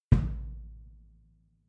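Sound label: bass drum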